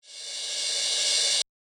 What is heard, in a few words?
Yamaha DJX 2B, Reverse Cymbal